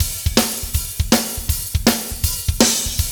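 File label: fast shuffle, Roland drum beats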